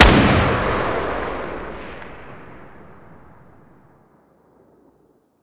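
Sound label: fire dist 762x39 rifle ext; gmod; arccw uc commons attachments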